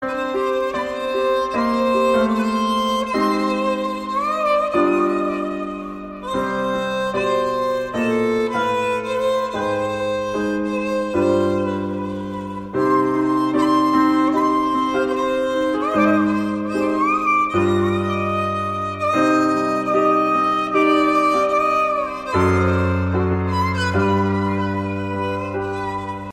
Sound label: sad music; classical